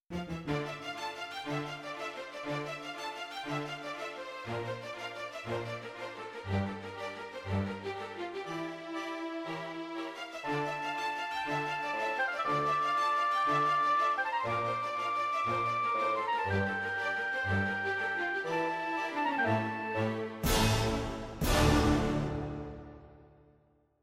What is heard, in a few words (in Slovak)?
Znelka